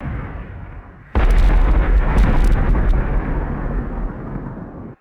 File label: distant double explosion thuds with long reverb